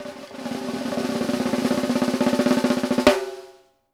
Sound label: ROLL, SNARES; Sampleheads - New York City Drumworks VOL-1; SW SNARES